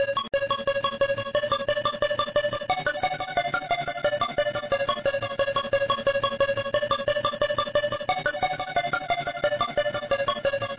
178 echoplex; remixable collections

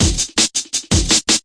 165 straight break; 161 to 180 bpm

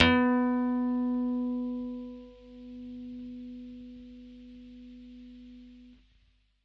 E 7 fret, acoustic guitar harmonics

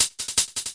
160 bpm galloping hats; 141 to 160 bpm

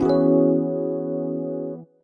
one staggered epiano chord; Melodic Stabs and Hits

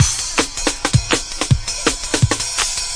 break, drum loops and breaks, 162 bpm; 161 to 180 bpm

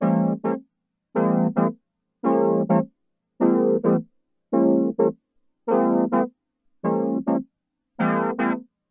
two chords simple synth; 105 Dibble Dobble

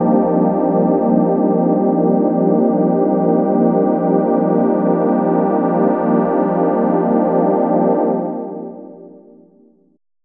pad transcendent; Pads

